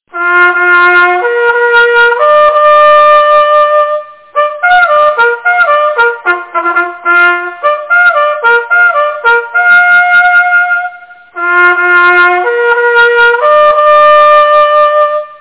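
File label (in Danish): dåhjorten, jagthorn; daahjorten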